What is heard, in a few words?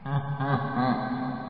laugh